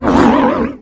roar; The Elder Scrolls III - Morrowind

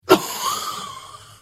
Hit; The Elder Scrolls III - Morrowind

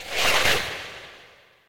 Dragon claw scratch

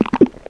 swallow; The Elder Scrolls III - Morrowind; Morrowind GOTY GOG

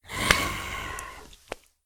Ghoul Growl; SyrinScape; East Ward

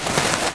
clothes; The Elder Scrolls III - Morrowind; Morrowind GOTY GOG

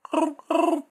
bulb bulb frog; SyrinScape; Syrinscape unplugged; Jungleplanet dinolaser battle

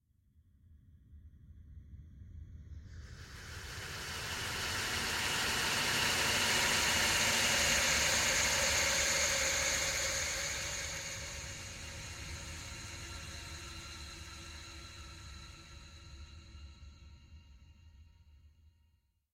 Shudder ghost; SyrinScape SoundsScape Collection; bbh Haunting part 1; TTRPG

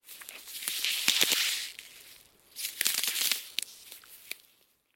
Ice crackles; SyrinScape SoundsScape Collection; bbh Haunting part 1; TTRPG

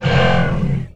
fab Verm Moan; The Elder Scrolls III - Morrowind

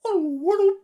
Hello bird; SyrinScape; Syrinscape unplugged; Jungleplanet dinolaser battle

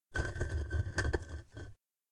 Deep Rumble Walls

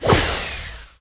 enchant, magic; The Elder Scrolls III - Morrowind; Morrowind GOTY GOG

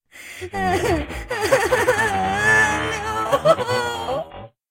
laughs; Nemira; SyrinScape; Iris hill manor

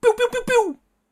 laser guns

